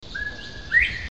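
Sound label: Bob White Quail; upland birds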